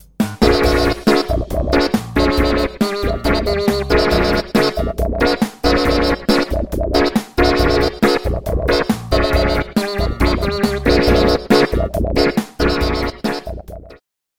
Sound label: lead trembling ripples